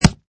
Impact Hard, Hit or kick impacts with hard object such as wall or board; Punches and Hits; Combat; Impact hard 04: Hit or kick impacts with hard object such as wall or board.